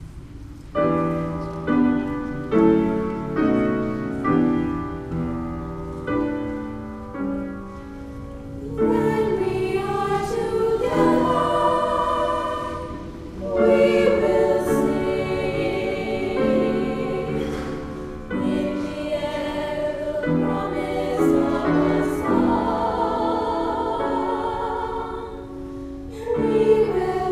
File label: Fanfare For Young Voices